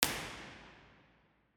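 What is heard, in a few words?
omni, impulse response, Lady Chapel St Albans cathedral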